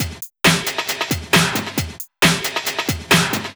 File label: 135 DRUMLOOP; Loopmasters; Booty Breaks Vol.2